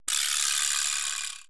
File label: 80S DRUM SET